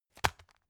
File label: tissue package drop